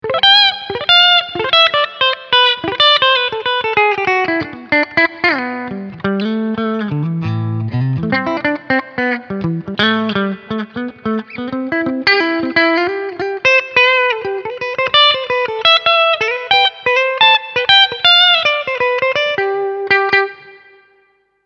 Clean Picking beide HB

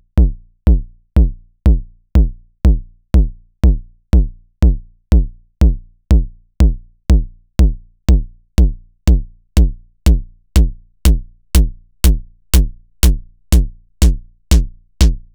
Kickain Schnelle Parameterfahrt CLICK; Knobula

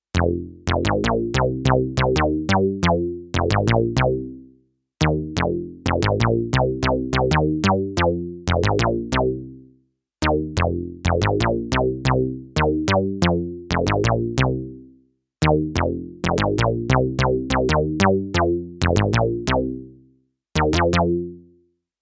Resonanz Bass B

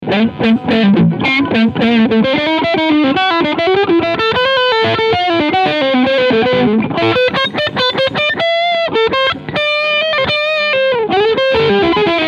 Crunch beide PUs

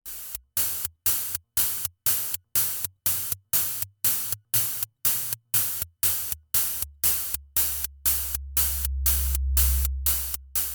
twin VC Filter HP Filter Eigenresonanz

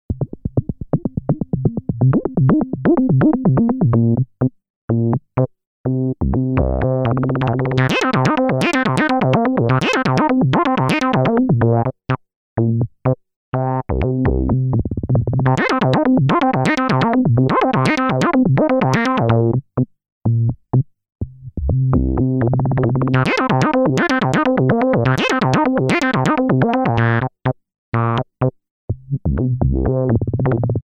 Peavey Spectrum Analog Filter Sequenz MIDI CC

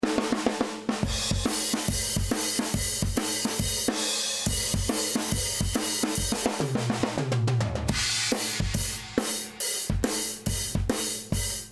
Drums Raum Mono; NUKE

